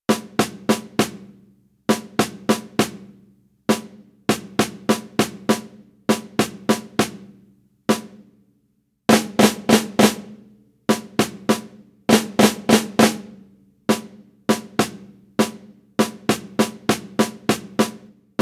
snare; housenka caterpillar

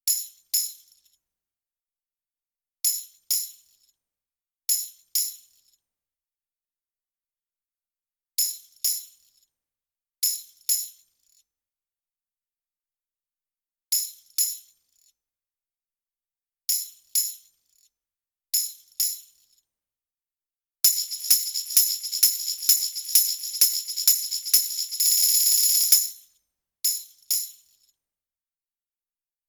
tiddler tambourin

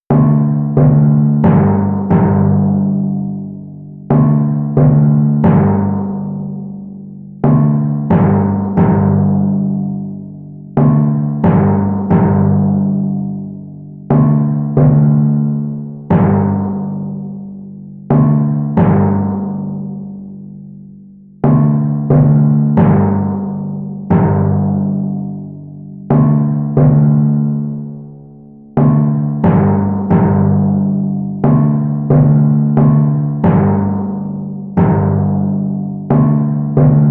monkey bars timpani

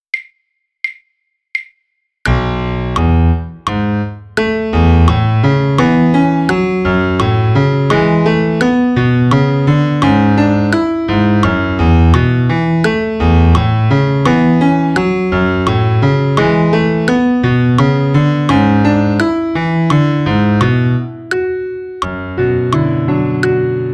waltz piano click

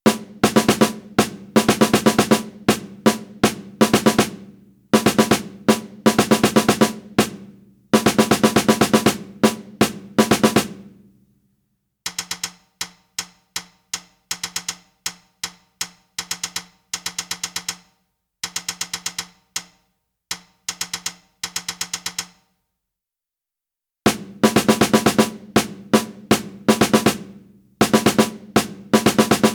snare; centipede

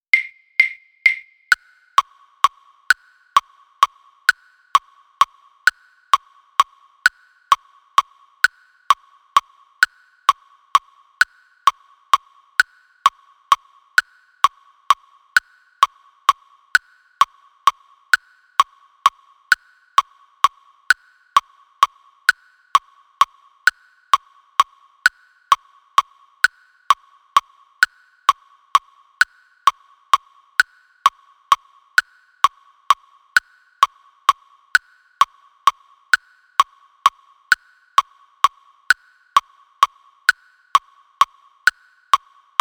click; kobylka grasshopper

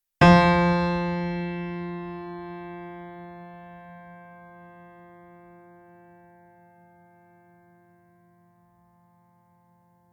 tuning f; honena tag play

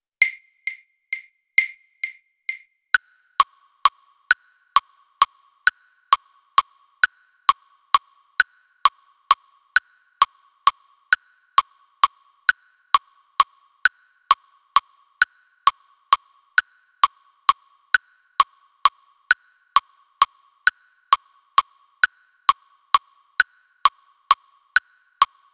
ballad click; balada

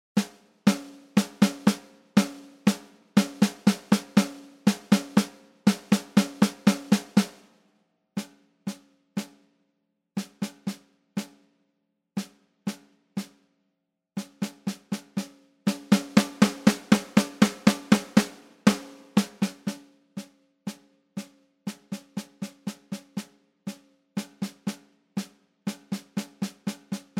snare drum 120 bpm; etude no 3; level i

